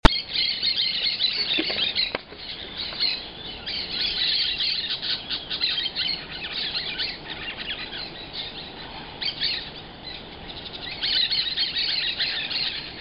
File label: Parakeets; panama